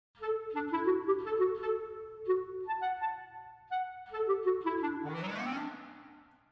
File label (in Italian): clarinetto